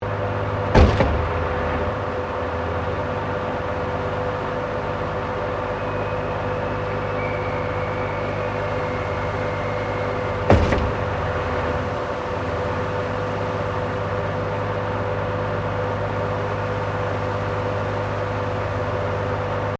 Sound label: Construction Cement Pump Operating Loop, Industrial cement pump operating